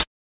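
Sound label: house hi hat; E-Lab; X Static Goldmine 1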